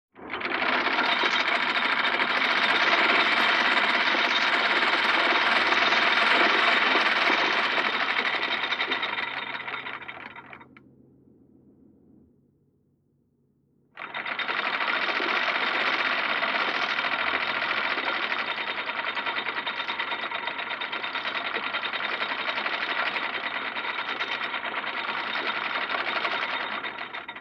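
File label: anchor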